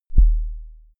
sub kick clean transient

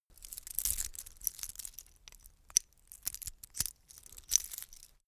metal watchstrap rattle

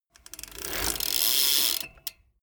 bmx bike pedal once freewheel brake short

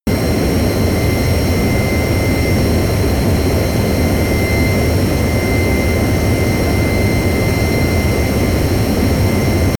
plane boeing 737 turbine full power direct

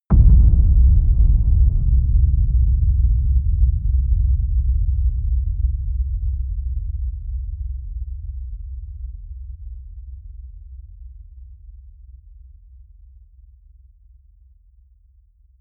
low boom long

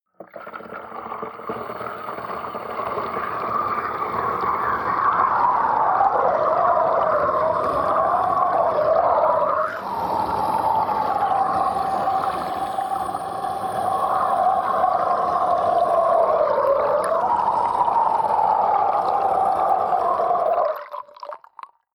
flowing submerged small long

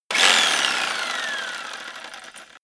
jigsaw on load short

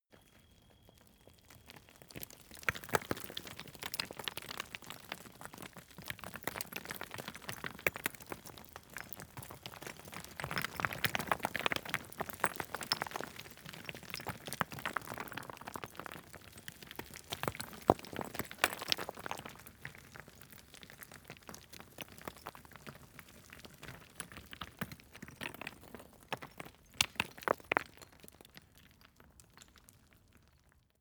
stone medium landslide long

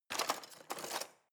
knives wood drawer grab hard

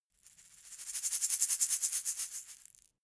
shaker plastic egg rattle dynamic

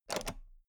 toolbox latch open heavy

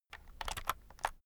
keyboard external shortcut long

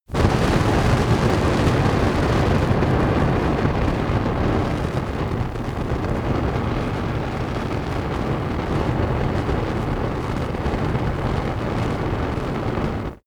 fire burst

